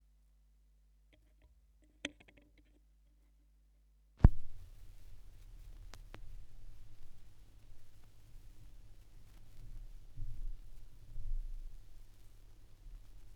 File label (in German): schallplattengeraeusche, Vinyl